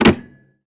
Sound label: Auto Lever Brake Release; Automobiles